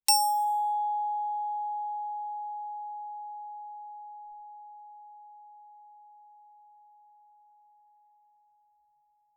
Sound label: bells brass ff Ab5 stereo, Percussion